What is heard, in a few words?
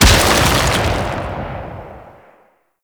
Brawler Rock Punch Impact; Warframe; Warframes